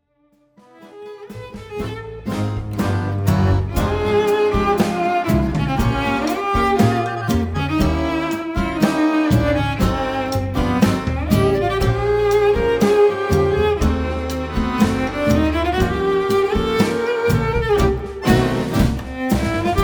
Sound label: Tango Gitano Cello